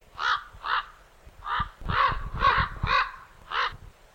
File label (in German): Kolkrabe Ruf